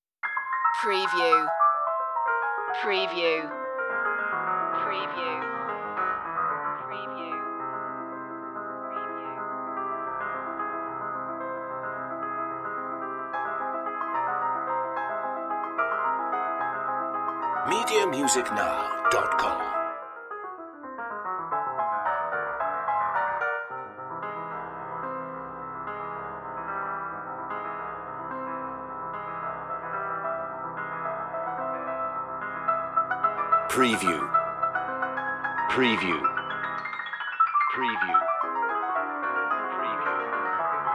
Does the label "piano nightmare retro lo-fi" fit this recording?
Yes